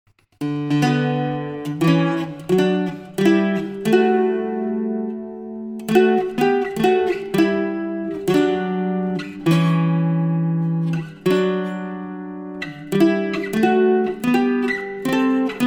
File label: bouzouki chords